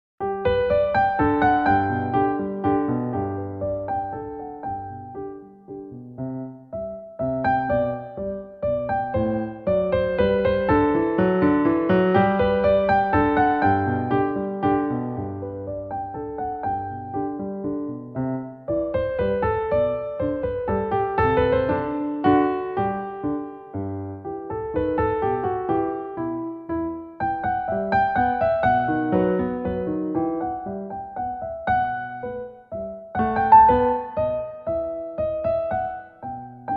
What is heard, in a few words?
Bach Minuet G Arp